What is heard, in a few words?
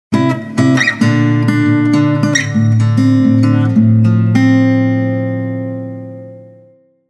gentle guitar